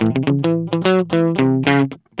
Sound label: GUI, LICKS; 110 LICKS